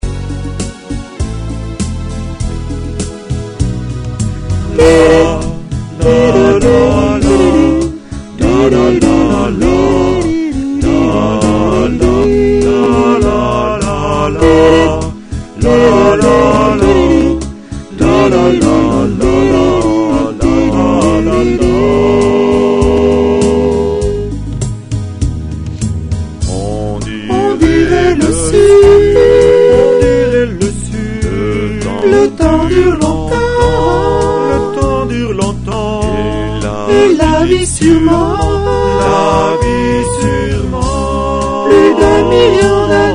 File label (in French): le sud intermede tutti